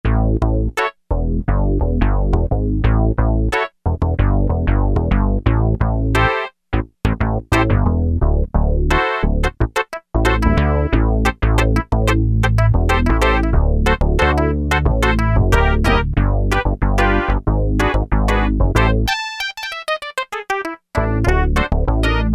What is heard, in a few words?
Synth Bass - Clav, Roland MKS-70